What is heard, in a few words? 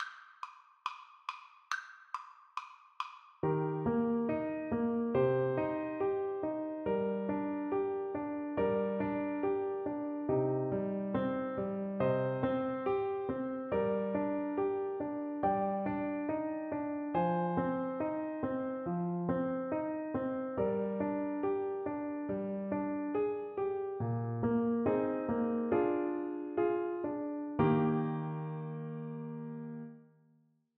Oboe